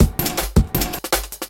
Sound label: Beat, Futurist Drum & Bass; Drums & Percussion